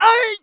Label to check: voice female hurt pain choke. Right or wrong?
Wrong